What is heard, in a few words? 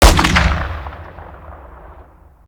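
weapon br2 fire player atmo ext; gmod; viper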